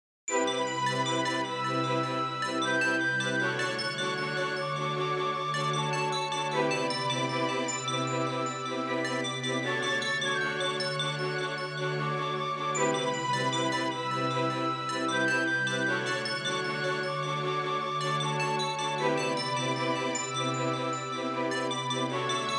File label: Win Loop; yuugen gaisha brave company